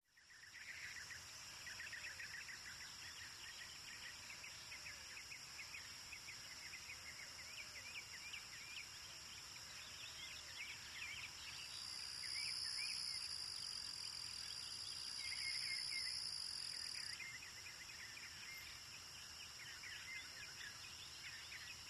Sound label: California Birds Ins, country